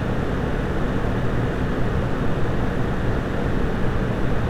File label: Fan; SciFi Warehouse Kit